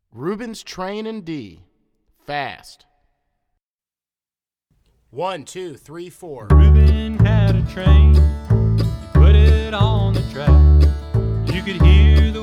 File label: Ruebens Train D Fast